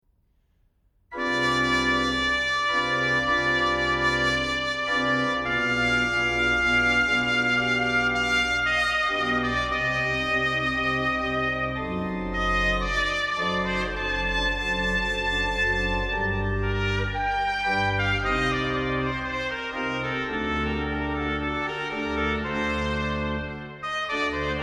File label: Concerto in C minor - Grave, Trumpet And Organ; Georg Philip Telemann